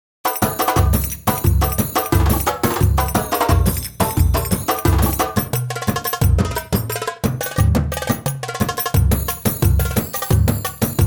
World Percussion

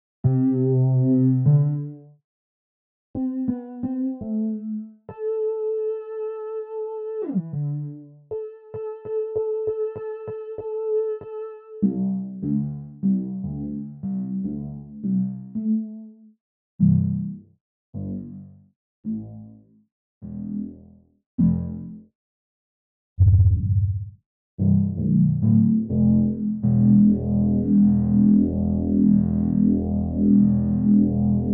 Bass III

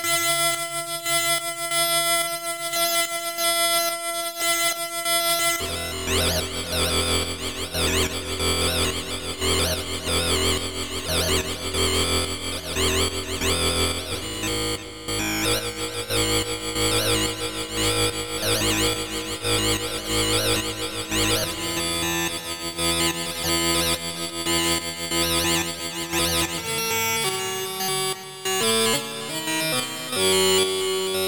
Multiphonics

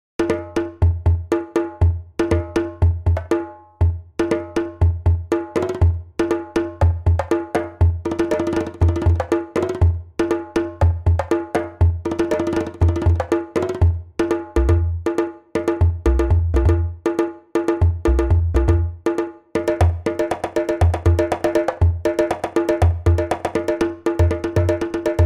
World Percussion

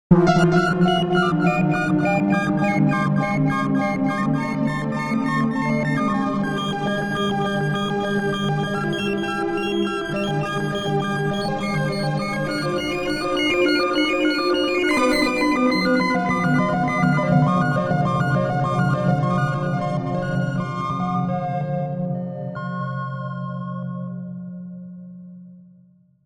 Buchla Easel V

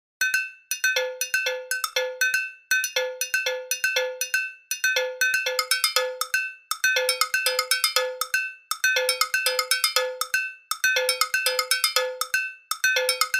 World Percussion

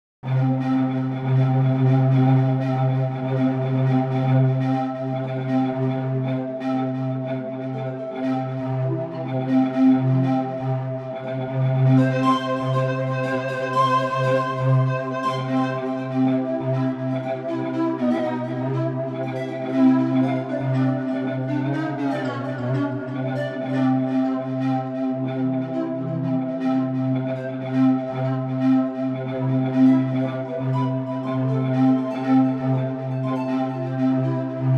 World Instruments

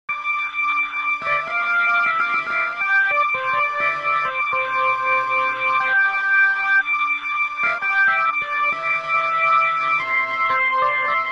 Fade Wheel

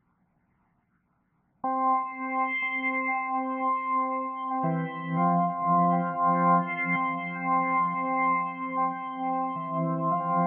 Analog Lab

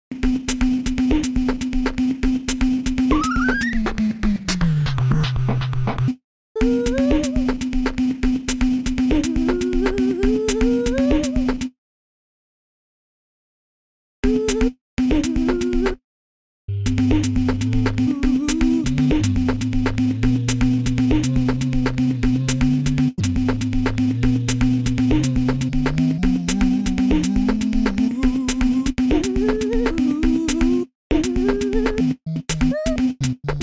Transfuser

